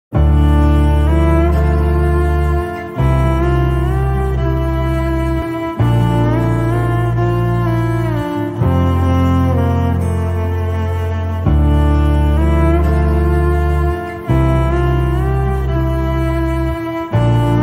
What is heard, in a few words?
christian bgm ringtone christian bgm music